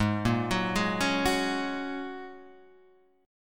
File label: G sharp 7th Suspended 2nd Suspended 4th G sharp Guitar Half Step Down; G#7sus2sus4